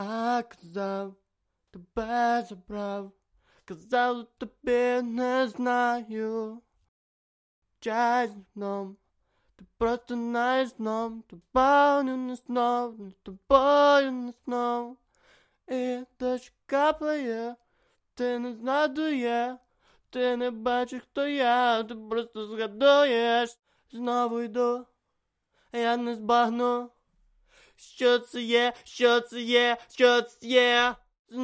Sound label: pop edm 122 Em